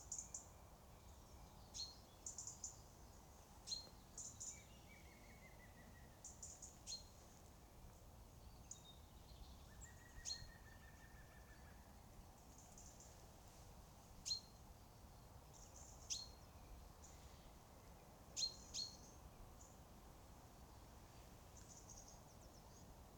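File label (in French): Pic Vert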